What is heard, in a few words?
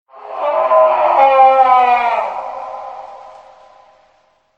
Scream; Slendrina